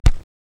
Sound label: Punch; Adobe; after effect; Action Essentials 2; Bonus Sound FX